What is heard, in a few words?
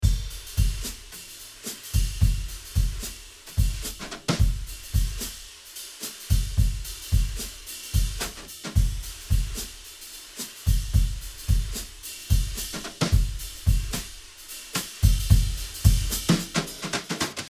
Jazzflow